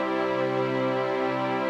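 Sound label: Pad C major, Orchestral; Sonic Foundry (Sony Creative Software); Orchestral VOL-2; Pads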